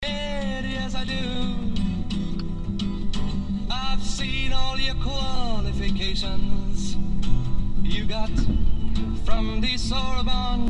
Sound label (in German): Streifkollision Reifen Motor; Radiomittel; Experimentelle Untersuchungen zur akustischen Wahrnehmung von Kleinkollisionen